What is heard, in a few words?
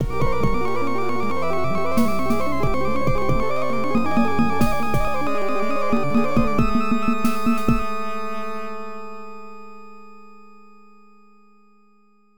Death; Dark Seed; IBM PC AT